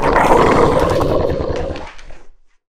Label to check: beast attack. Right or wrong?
Right